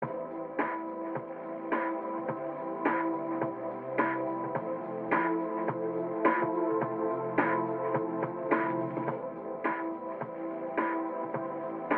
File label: radio music ambient; Mirror's Edge (2008)